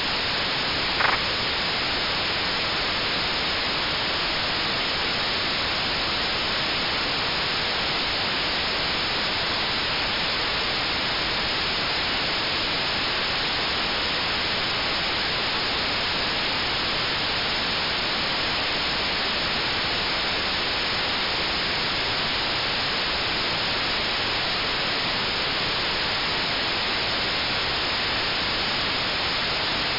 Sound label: MSK144 20ms 30ms train; 432mhz